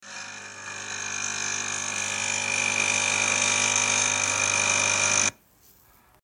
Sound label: coffe sound